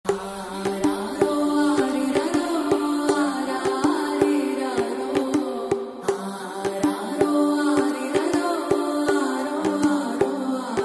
Song Bgm Ringtone; kanguva

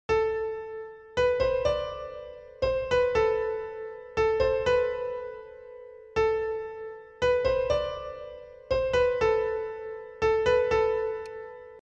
bgm piano

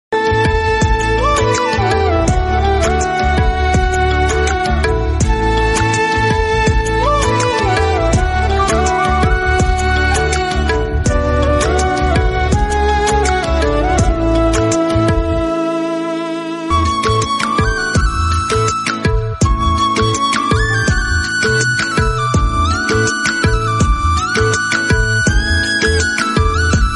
hindi flute instrumental ringtone

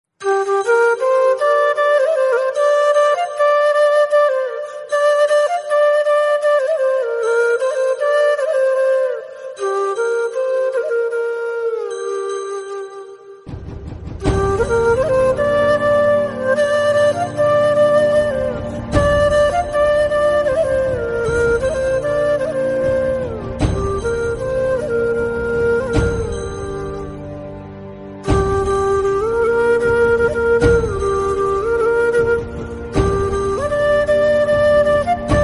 bgm, telugu ringtone; rrr; ntr

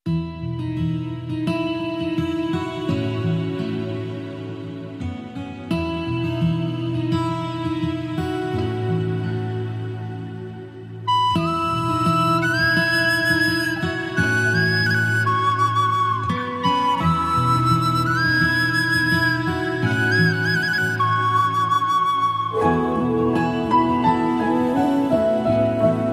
flute effect ringtone

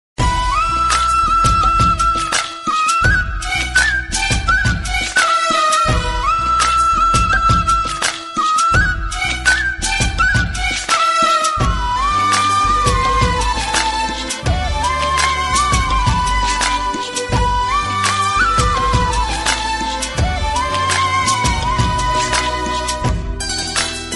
love bgm song; Pappi Appacha movie

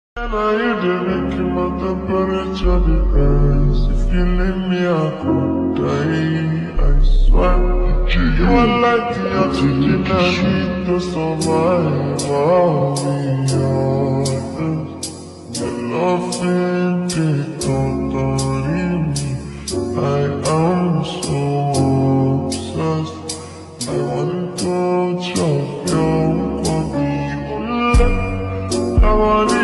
slowed reverb ringtone

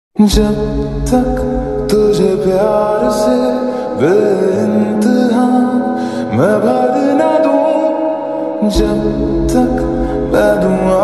slowed and reverb

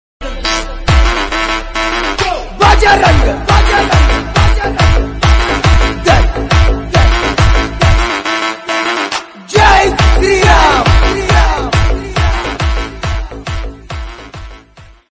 ringtone jai sri ram phone ring tone; bajarangi

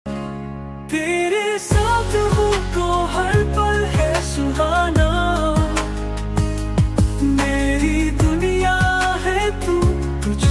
Hindi Ringtone Ringtone Song Love ringtone

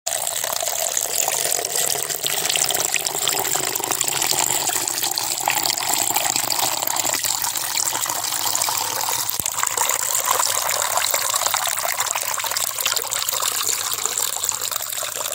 real water dropping ringtone water ringtone

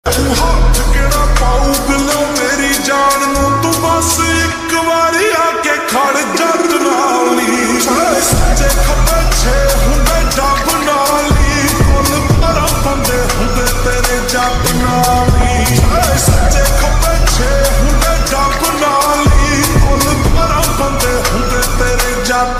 attitude ringtone punjabi ringtone

